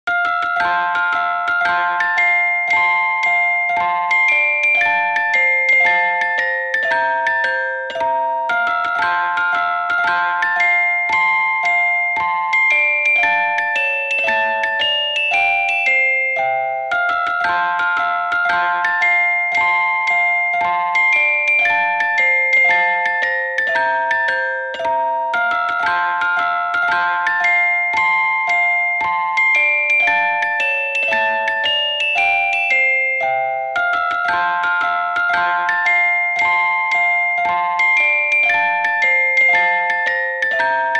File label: Ice Cream Truck, March to Victory, 114